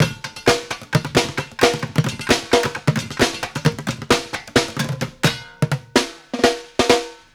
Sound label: Street Groove, Ambient Grooves